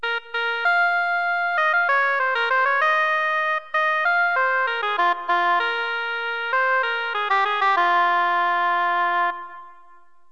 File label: oboe; Roland; An Invitation To The Roland World Of Music